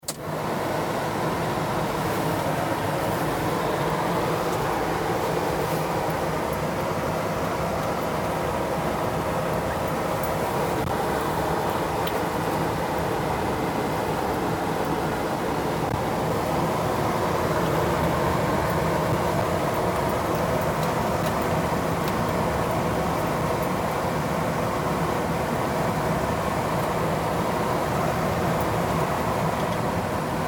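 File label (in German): Auto Lüfter einschalten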